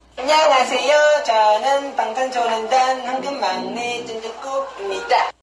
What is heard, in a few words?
Chant